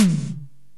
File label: SDS8 TOM; Hollywood Edge; The Big Bad Beatmachine